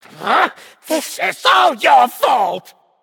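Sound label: non-verbal boohoo; smite; voicepack; jingwei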